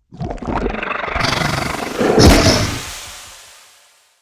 seed frog; monster

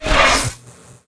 elec bird damage, monster

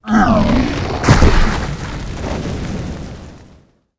mummy dead, monster

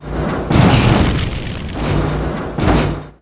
trap fire attack

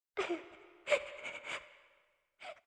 ghost woman idle, monster

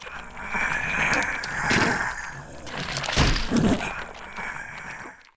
ant, monster

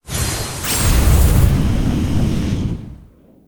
cap atk; Ensign; war3

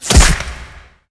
skill explo

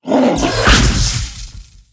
dark knight attack, monster

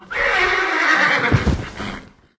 caballus dead, monster

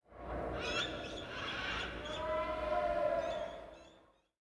entrance, snow

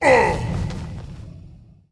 vampire damage, monster

